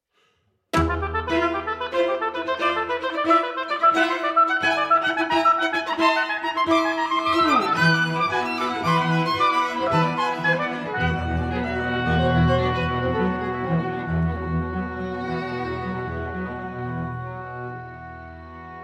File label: oboe quartet toccare